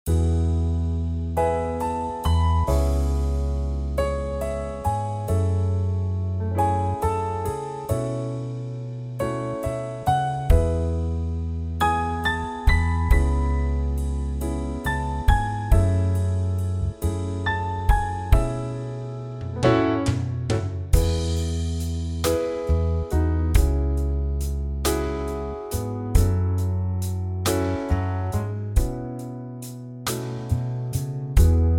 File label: E trio